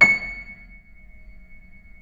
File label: keyboards acoustic C#6; USB Soundscan; AKAI